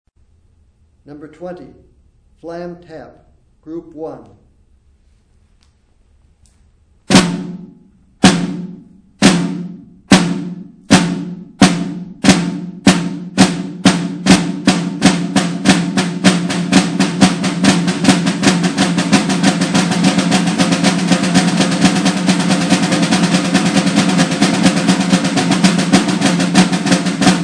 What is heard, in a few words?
snare rudiment